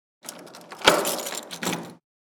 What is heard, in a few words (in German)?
Tuer schliessen abziehen